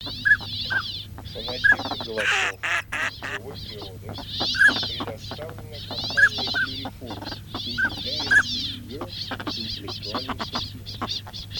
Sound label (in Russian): Шилохвость Codone; plurifon